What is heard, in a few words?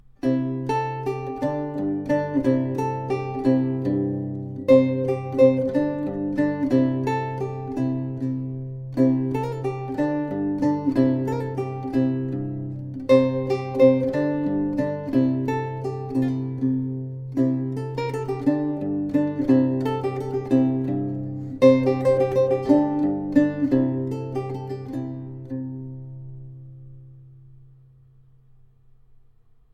A Hornepippe, Lute; Blame Not My Lute